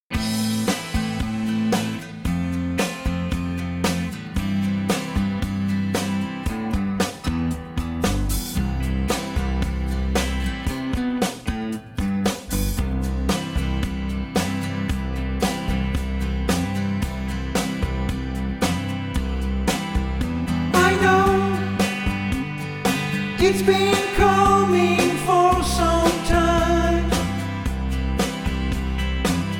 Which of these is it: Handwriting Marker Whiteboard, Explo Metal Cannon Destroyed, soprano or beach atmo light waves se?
soprano